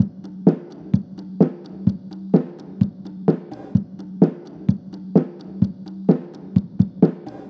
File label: Loops 128BPM, Drumloops; Vengeance - Essential Clubsounds Vol.5